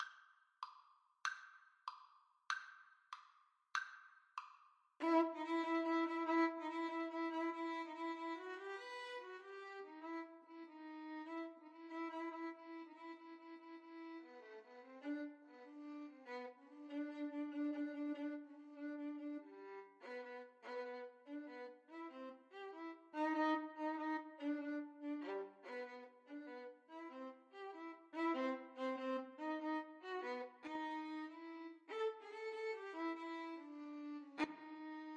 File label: Violin Duet